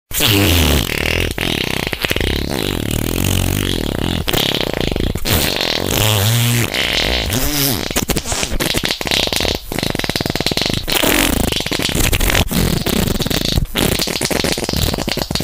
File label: farter